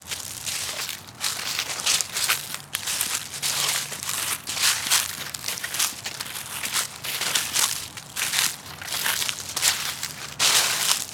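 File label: Footsteps Walk Thru Dry Leaves; Fairlight Sound Lib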